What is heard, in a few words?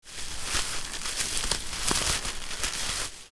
Fishing net handling rustle